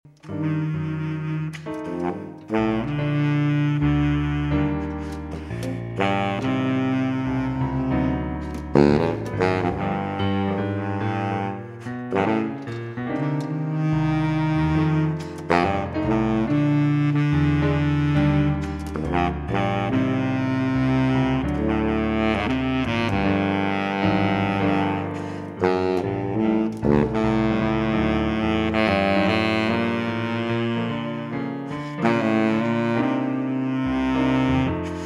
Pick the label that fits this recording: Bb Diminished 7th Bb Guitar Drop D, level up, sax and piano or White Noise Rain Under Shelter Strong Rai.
sax and piano